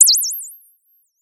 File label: Sound of a bird tweeting nicely